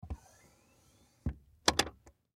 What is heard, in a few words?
Chair Hydraulic, Office; Lift Lever Down; Blastwave